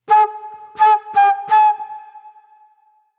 Flute Noir

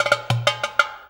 DARBUKKA; Best Service; World Colours; DARBUKKA MLT

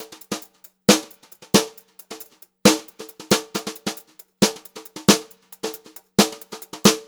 136 VARI, Brushed Drum Loops; USB Soundscan vol.39; AKAI; HOTROD

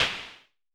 SN Low Punch, Vermona Mono15 Drums Snare

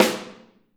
Snare OH; Snare OH All; Drums Percussion

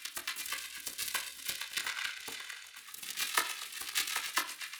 Cracklature, sparse soundscape

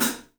3D Drums, TIGHT ZILDJ; 3D Sound

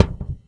Heartbeat Loop, Kicks; Airwindows

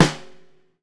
STR. SNAR; Drums&Percussion; E-MU Producer Series Vol. 7 – Old World Instruments

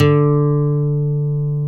NYLON D 3, Acoustic Guitars; AKAI S6000 CD-ROM - Ultimate

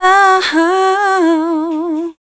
VOC F, Dance Vocals, FEMALE

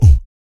VOC PERC, Vocal Planet Jazz FX BASS PERC

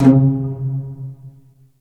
JD PIZ, STRGS

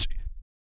BIG BREATH

